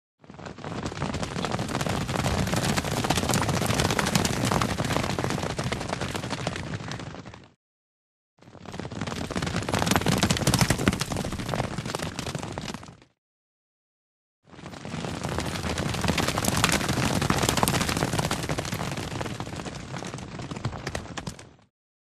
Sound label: By 10 Horses Up Past At